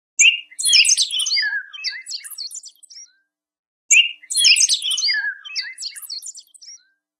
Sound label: Htc Bird